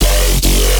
growl

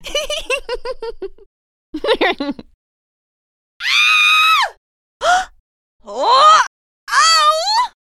sky noise

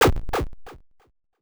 death; bigtowertinysquare

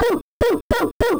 WOO

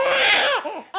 baby cry crying

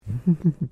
laugh

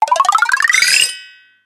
2nd Player Entry, Common Sound Effects; Arcade; Taiko no Tatsujin 2020 Version

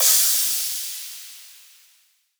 House Crash; Cymatics

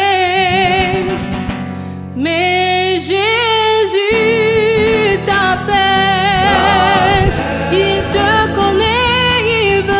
female sings

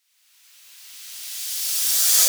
House Crash; Cymatics

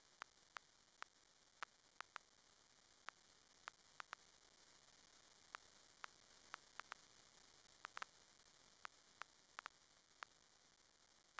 vinyl noise

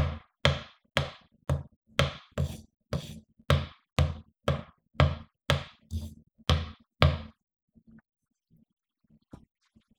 carpet

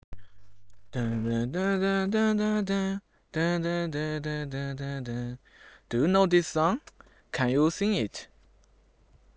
sing

